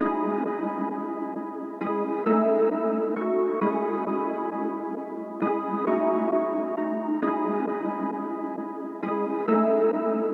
133 BPM C# Min Synth Chord; Trap; Melodies; Loop Stems; Cymatics; Trap Starter Pack; Harm's Way

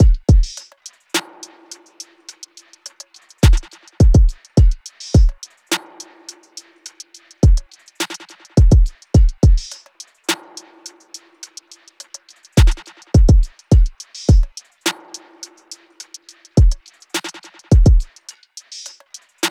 RnB Drum Loop - 105 BPM; Cymatics; Orchid Sample Pack

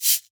Cabasa Large, World Percussion; Cymatics; Emperor World Percussion

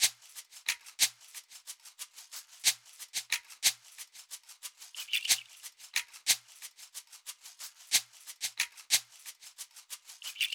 Tight Shaker Loop - 91 BPM; Drum Loops; Lofi; Cymatics; Lofi Starter Pack

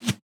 Freeze, Guns

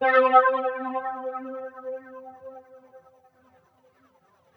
Swirly Pluck One Shot - C; Cymatics; PHARAOH Beta Pack; Pharaoh Premium Drum Samples (BETA)

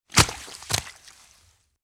Bud Impacts Body Fall Mud; Fairlight Sound Lib

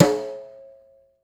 Steel Brush, Snares; Ghosthack - Free Snares 2023

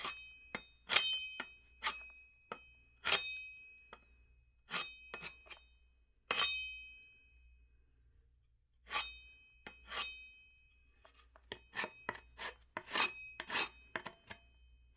Carving Wood With Metal; Fairlight Sound Lib

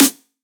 Dubstep Snare; Ghosthack

